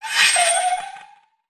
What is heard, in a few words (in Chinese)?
持物待机声; 悦灵